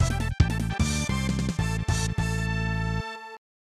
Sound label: End Battle; super variable geo